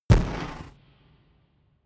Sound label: gun pop gun shot